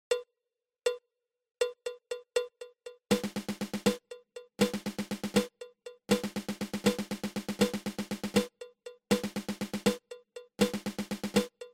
Flam Paradiddle diddle

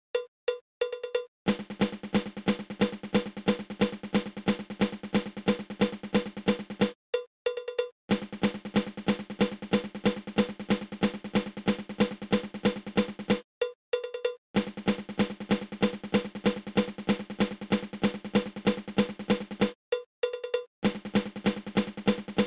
Flam Accent

